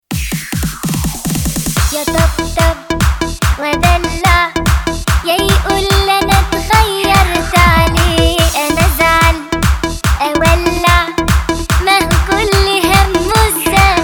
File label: Tarkib Arabic kordi